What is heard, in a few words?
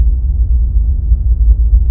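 space background; AKAI S-Series CD-ROM Sound Library VOL-6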